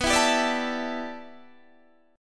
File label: G 6th Add 9th B, chord